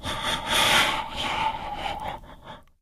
breathing, infected; npc; idle

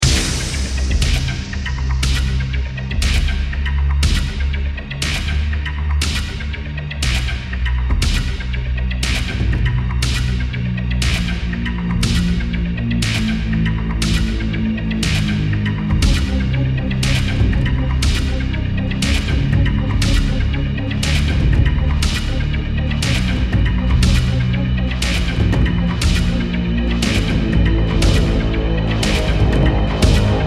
bomb planted, music; Counter-Strike Global Offensive

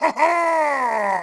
Fort Vox; Sid Meier's Civilization 4 Complete; Warlords; Units; Omen

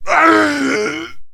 man death; Mount&Blade Warband

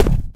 charger run right, footsteps; Left 4 Dead 2

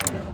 click window open; Hearts of Iron IV Death or Dishonor

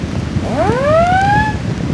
ship destroyer damaged attacking; Hearts of Iron IV Death or Dishonor

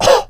choke, survivor voice; Left 4 Dead 2

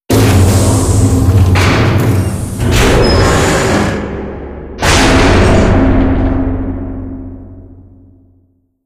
c5 bridge lower

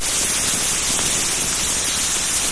steam; Tank

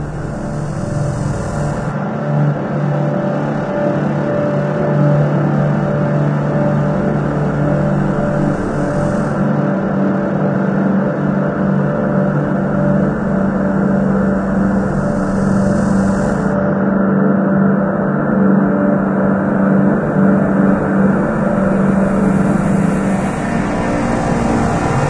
citadel drone loop; Garry's Mod; hl2

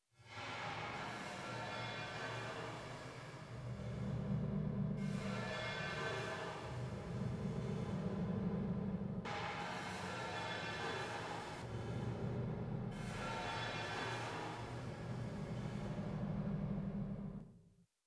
mining place; GrandFantasia

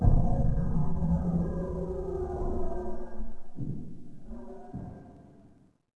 Pound Growl; Ambient; Sid Meier's Civilization 4 Complete; Beyond the Sword

